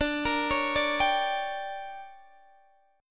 D Major 7th Suspended 4th Sharp 5th, Guitar Open D